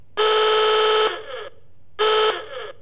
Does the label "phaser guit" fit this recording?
No